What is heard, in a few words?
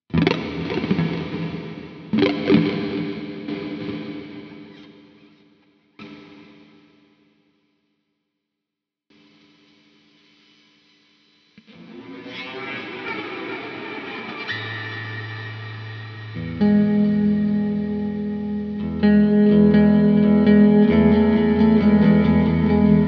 guitarix session